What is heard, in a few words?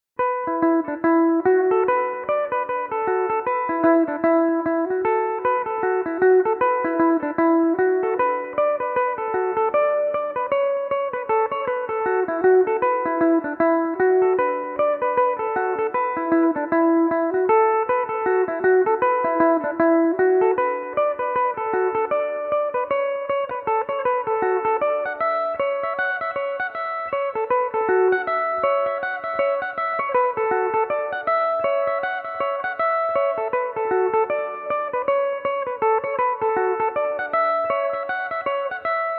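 E dorian, irish mandolin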